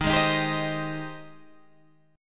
G sharp Major 7th D sharp

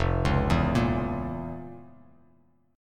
F Major 7th Suspended 4th Sharp 5th F; FM7sus4#5 arpeggiated; JGuitar

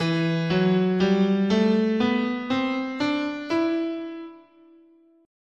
E Aeolian, guitar, scale

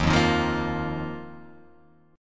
Eb Suspended 2nd down Guitar Open F; Ebsus2 strummed; JGuitar

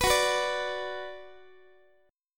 Gb Suspended 4th Sharp 5th Gb, down, Guitar

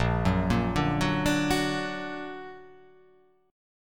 A sharp Augmented

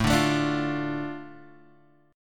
A Suspended 4th Sharp 5th, Asus4#5 chord; strummed; x,0,0,2,3,1; JGuitar

